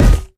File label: physics cube